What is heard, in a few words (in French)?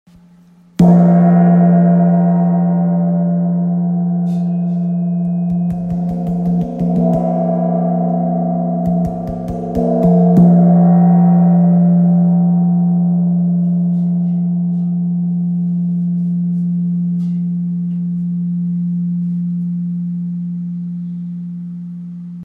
Chau gong fa 40cm